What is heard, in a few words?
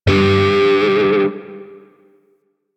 Shawzin Flourish Oct One G; DE; Warframe; Grineer Shawzin